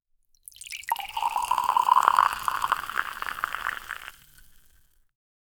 sparkling wine being pour

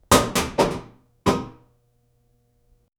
place batter tup in oven, cooking

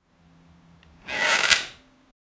grabbing a wooden pole, room

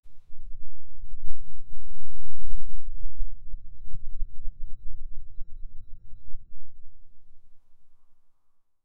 a single wet reptilian, reptile

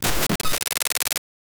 crime hacker os boot sfx

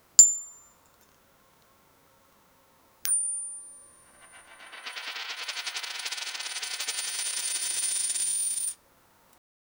collecting a coin in a, collectible

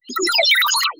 pixelated synth wave

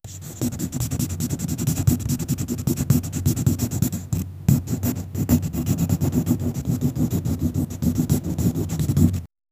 gentle continuous sound, crayon

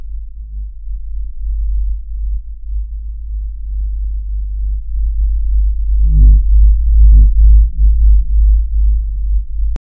calm ambient spa hum

